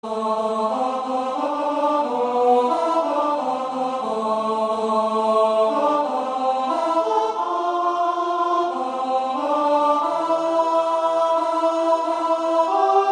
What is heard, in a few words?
All in the morning D Tenor